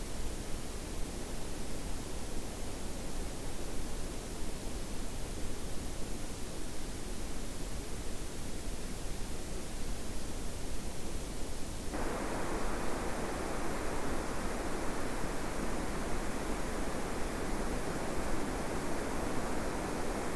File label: WFR audio emfisis